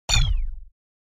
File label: Sci fi energy ball short futuristic